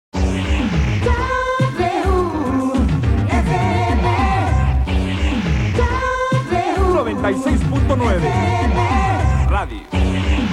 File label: Jingle, radio